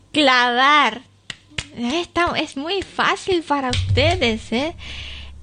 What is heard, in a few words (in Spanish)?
clavar